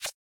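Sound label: Pen detach notification; Samsung Galaxy S7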